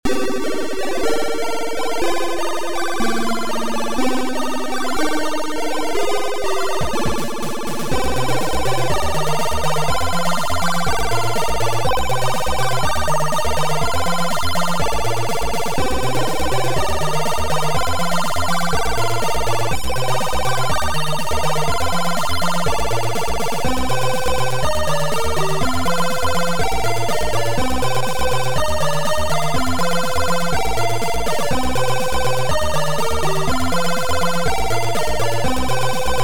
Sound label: Mega fill